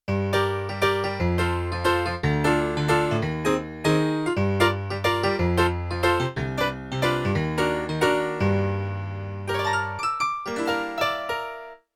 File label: A Piano & Oct-Up EP; Yamaha TX16W; Jazz Performance; Jazz Performance 2